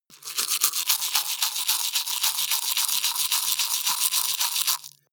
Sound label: teeth brush dry open circulate